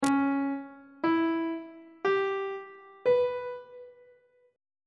d flat half diminished 7th chord; on treble clef

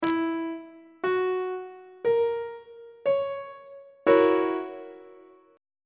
g flat dominant 7th 3rd inversion on treble clef

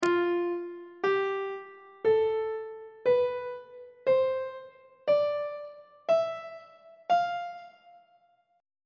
e sharp lydian mode note positions on treble clef

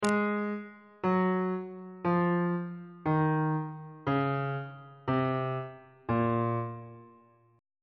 a sharp aeolian mode descending

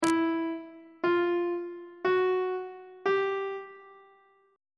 e 2nd intervals; on treble clef

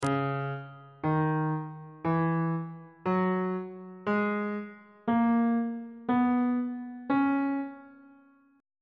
d flat dorian mode on bass clef

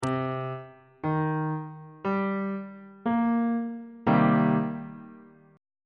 e flat major 6th 3rd inversion on bass clef

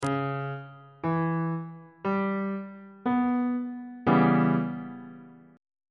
e minor 6th 3rd inversion on bass clef

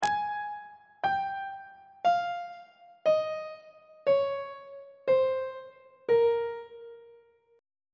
a sharp dorian mode descending on treble clef

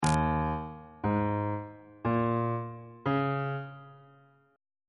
e flat dominant 7th suspended 4th chord intervals

